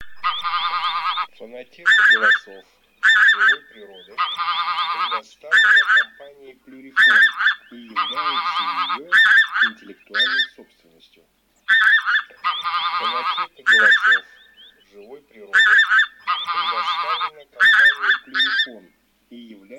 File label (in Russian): Гуменник Oca Granaiola; plurifon